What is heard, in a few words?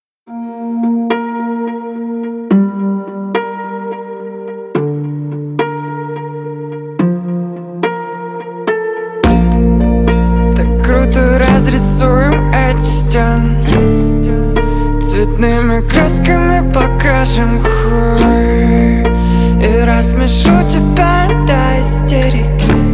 acoustic